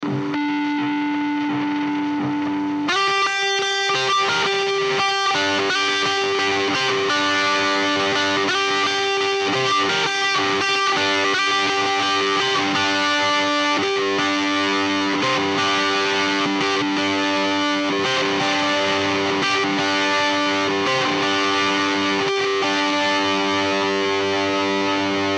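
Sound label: G 170 guitar riff trash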